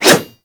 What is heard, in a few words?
Dagger Heavy Whoosh; Warframe